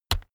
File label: keyboard external key push